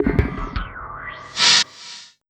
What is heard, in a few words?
80 FX, Industrial Loops; RISK